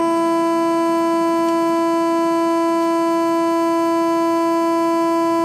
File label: nintendo wii sound of death